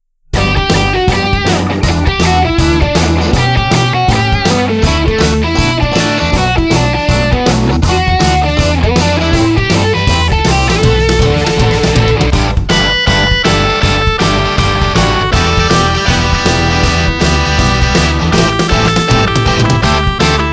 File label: train beat